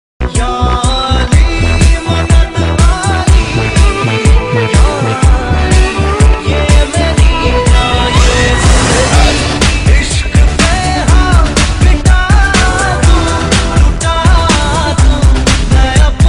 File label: Bollywood Song Ringtone